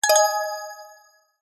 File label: multimedia game sound mobile game warm positive twinkle attention alert